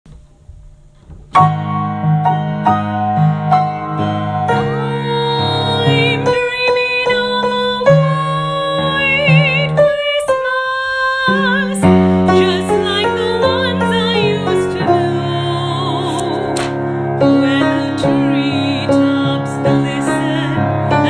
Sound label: alto